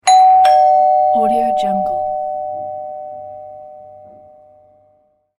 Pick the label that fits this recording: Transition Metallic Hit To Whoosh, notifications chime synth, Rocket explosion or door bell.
door bell